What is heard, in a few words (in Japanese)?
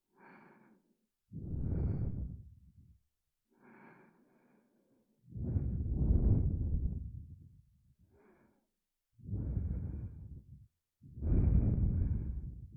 耳元密着ダブル甘辛ナチュラル吐息; 低音×甘声おねえさんと高音×ドS声おねえさんの耳元密着甘辛オナサポ